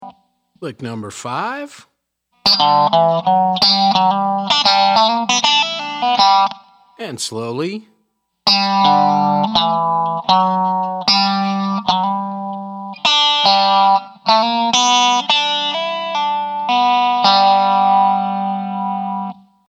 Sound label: resonator pedal, steel guitar